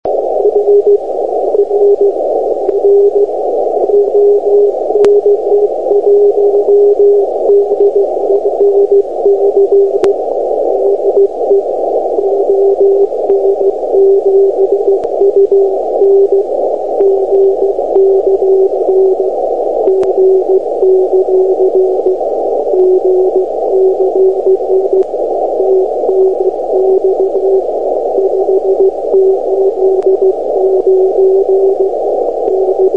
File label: CW QSO; eme